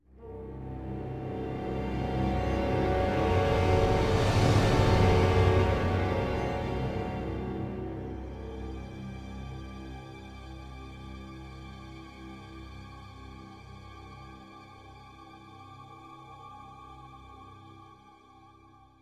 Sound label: Riser; N.O.V.A. 3 Near Orbit Vanguard Alliance